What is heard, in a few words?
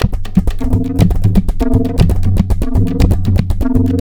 Tabla, 120 BPM; Zero-G; Pure Tabla